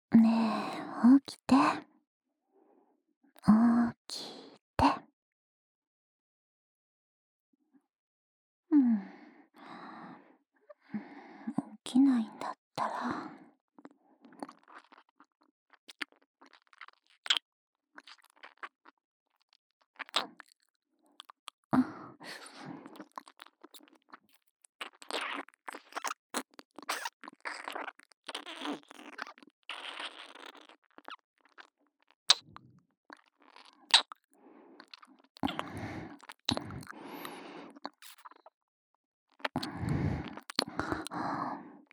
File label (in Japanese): 耳舐め目覚まし, ASMR; 効果音無し; 新妻栞